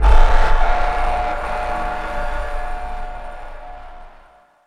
grapple sting out

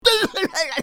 Pig hit; bad piggies; android; mobile; windows

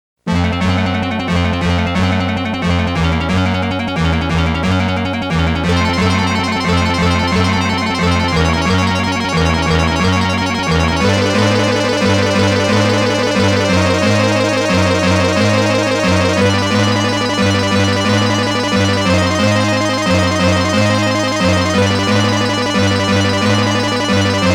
Boss BGM; Gun Smoke; Capcom Music Collection Vol.1

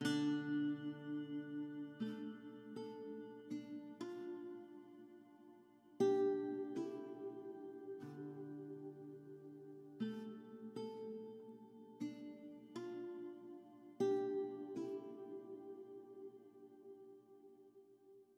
Harp

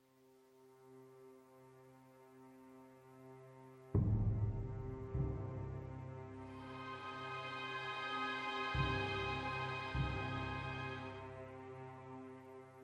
Loop Suspense; Game of Thrones: A Telltale Games Series

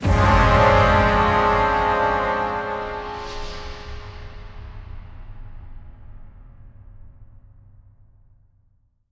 Death; Platoon

